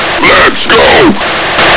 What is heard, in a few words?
charge